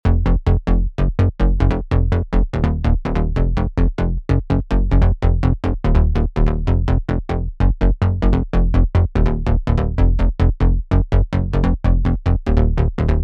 Bass Midi Phrygian F, goa trance

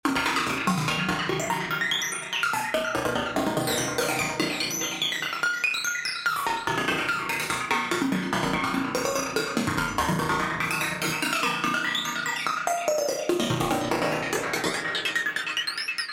robot smalltalk, goa psytrance; serum2 presets; JLS Fractalis

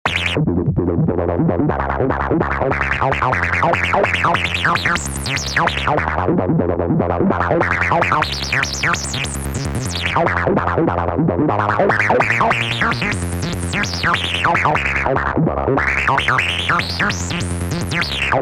Acid, goa trance bass

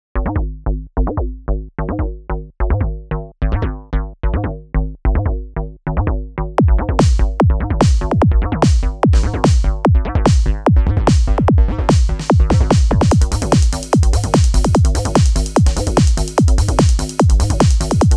Square 5, goa trance bass; Ableton Live 12; instrument racks

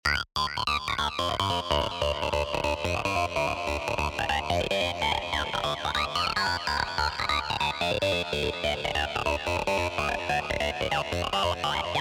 Lead Harmonic Minor D, goa trance; goa trance midi

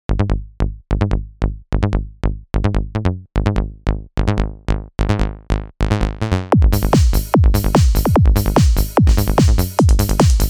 Mono Bass, goa trance; Ableton Live 12; instrument racks

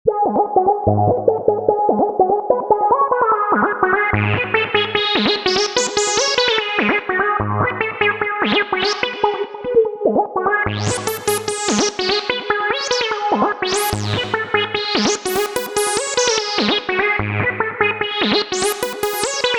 Acid, goa trance bass; Ableton Live 12; instrument racks

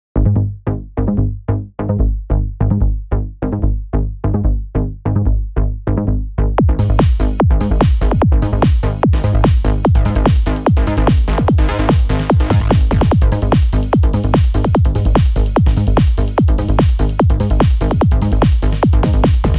Goa Bass; Ableton Live 12; instrument racks

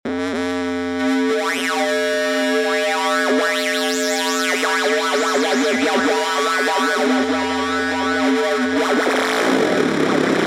ACID Square Transeiver, goa psytrance; serum2 presets; JLS Fractalis

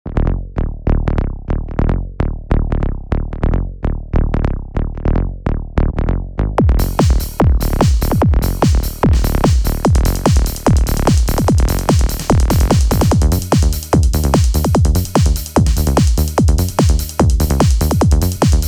Goa Bass; Ableton Live 12; instrument racks

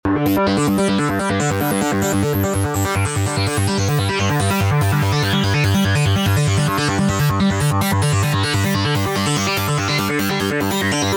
Seq Butter Sequence, goa trance vital preset; JLS Pulsaris; seti project

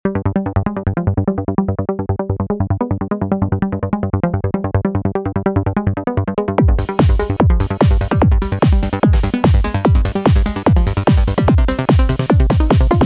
Stereo Bass, goa trance; Ableton Live 12; instrument racks

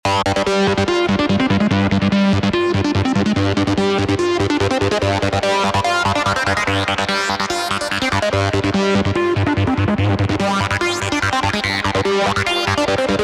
G Phrygian Dominant Lead, goa trance; goa trance midi

